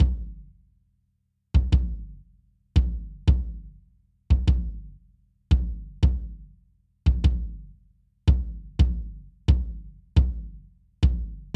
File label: Kick Drum With Compression